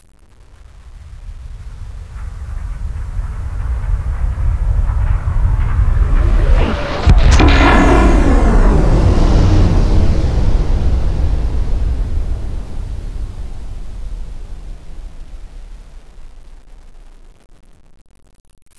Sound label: flyer appear; hl1 npc